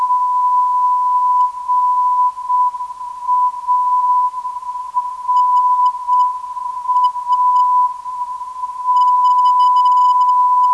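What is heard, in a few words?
283.5kHz, non-directional beacon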